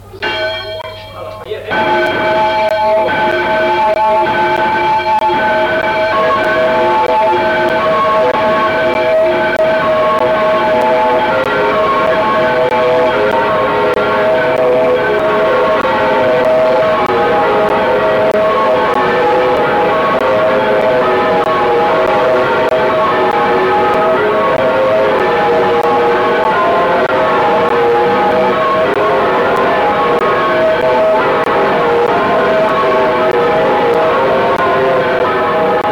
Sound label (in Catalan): campana